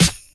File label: short acoustic snare sound f key; snare drum samples